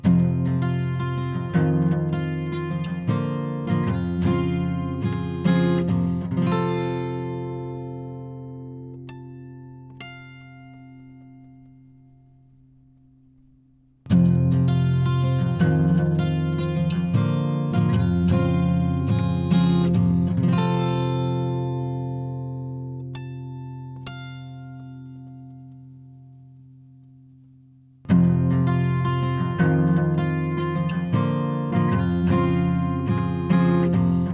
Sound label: SPIRIT mic review Ac Gtr